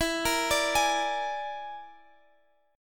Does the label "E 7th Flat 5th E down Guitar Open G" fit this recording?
Yes